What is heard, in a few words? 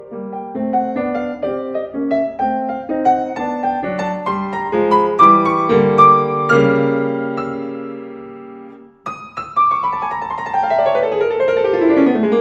mozart fantasia in d minor k397